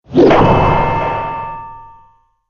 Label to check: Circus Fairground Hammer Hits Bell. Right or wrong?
Right